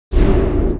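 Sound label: Mob attack; Bossmob; 욱일승천류; 야쿠모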